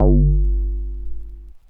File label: BassStation Overtone Vinyl Synths G0